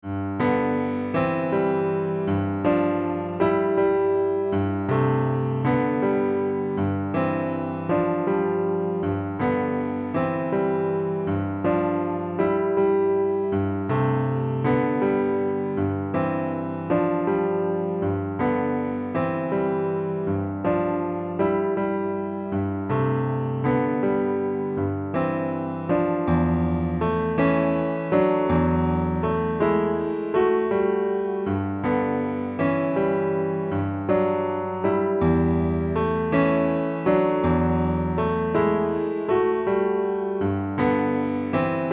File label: Accomp High